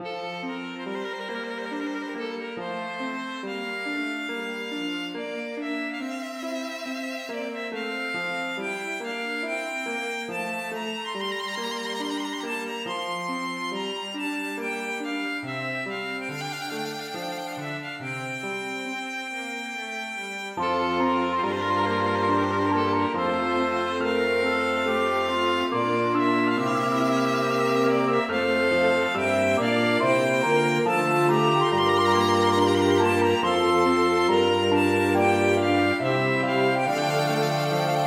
Menuet for fiolin og lite ensemble; Aamodt

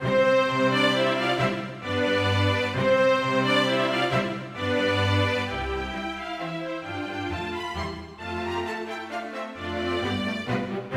Menuet for strykekvintett

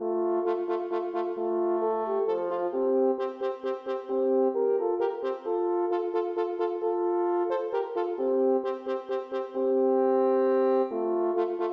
For to horn

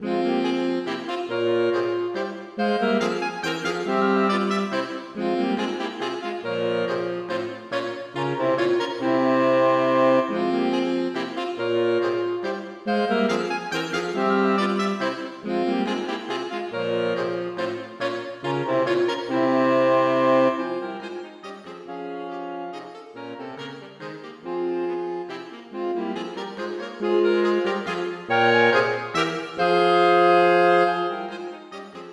Menuet - for saksofonkvartett; Aamodt; Menuet Madam Schel

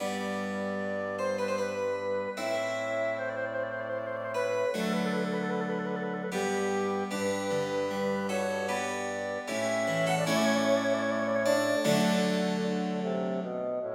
Aire Lentement for fløyte, fagott og piano; Aamodt

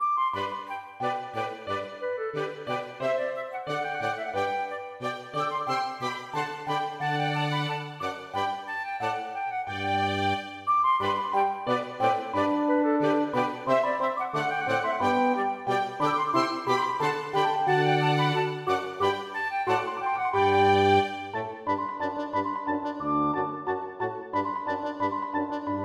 March for fløyte og lite ensemble; Aamodt

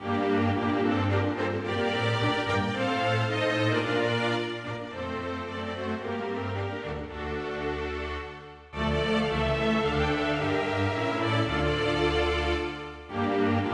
strykekvintett; Bang; Chrisillis du mit verdens Guld

